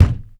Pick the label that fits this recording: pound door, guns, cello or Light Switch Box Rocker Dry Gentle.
pound door